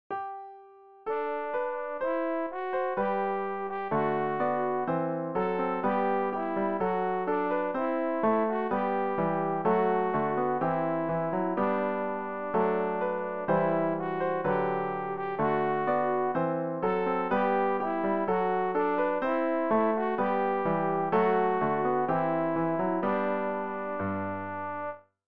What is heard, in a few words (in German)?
alt, Übehilfen für das Erlernen von Liedern; ref gesangbuch; rg 333 da pacem domine